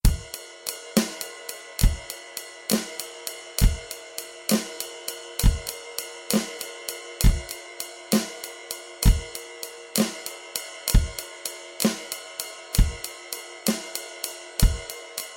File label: 12 8 simple